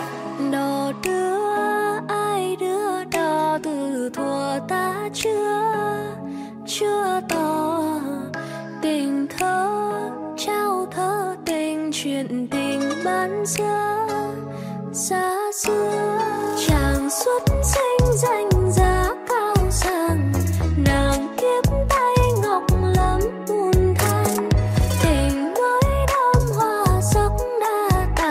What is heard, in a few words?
Drum